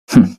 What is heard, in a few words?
thinking; twogami